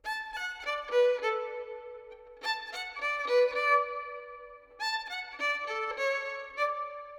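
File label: Verb, Violin, 4-4, D minor, 100 bpm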